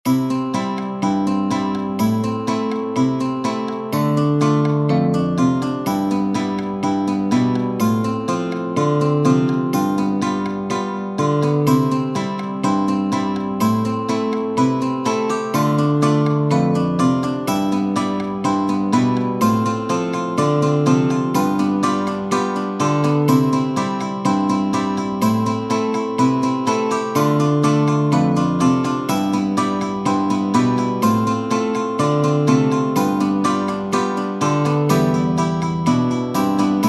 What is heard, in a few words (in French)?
pour quatuor guitares percussions; cocorullo fabrice 039 est parti